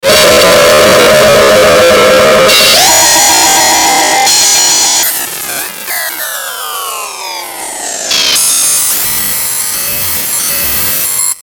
Digital Sequence Distortion Glitch, Digital Buzz Interference; Digital Sound Effects; Production Element Imaging Element Accent Transition